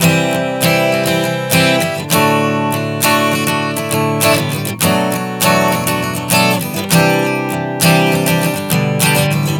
Progression 100 C-D-Bm7-C, Acoustic Guitar, Chord Progressions 100 bpm